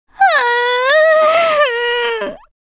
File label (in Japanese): エモ（悲しむ）「（泣き声）」